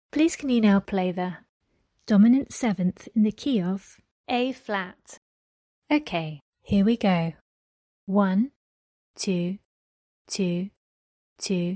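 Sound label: dominant 7th in the key of A flat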